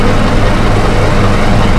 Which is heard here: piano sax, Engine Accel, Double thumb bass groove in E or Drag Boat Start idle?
Engine Accel